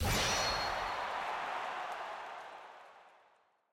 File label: status hype